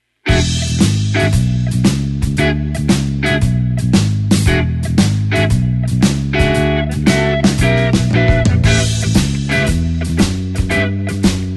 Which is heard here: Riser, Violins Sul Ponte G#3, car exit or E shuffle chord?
E shuffle chord